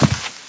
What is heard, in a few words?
Carpet Medium, shagov